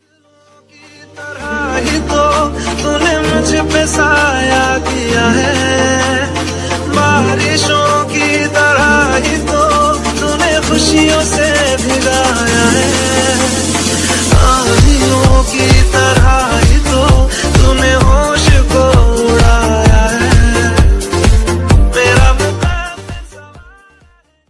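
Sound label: bollywood music ringtone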